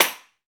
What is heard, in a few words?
PRC tambourine